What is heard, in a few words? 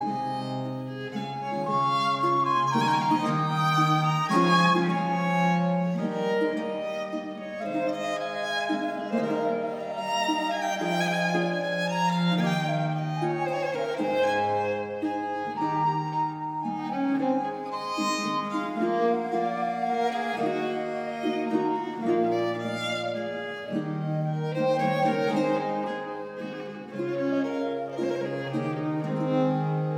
Andamento for the violin; Matteis Nicola